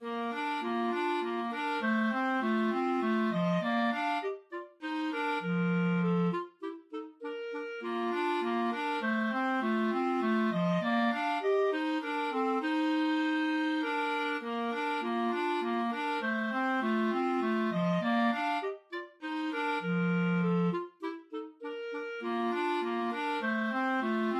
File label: clarinet duet; wanhall